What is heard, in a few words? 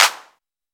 dance clap